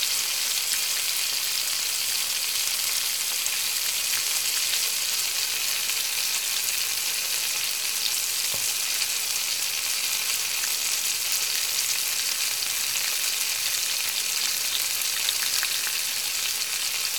frying; Golden Crayon Sounds

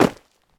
dirt land; Golden Crayon Sounds

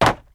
wood land; Golden Crayon Sounds

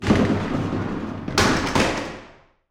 door close; Golden Crayon Sounds

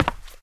wood step; Golden Crayon Sounds

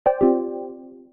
error; Original TK Sounds

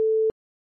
call waiting tone ansi; iOS Sounds

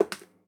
ceramic step; Golden Crayon Sounds

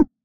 menu edge; Golden Crayon Sounds

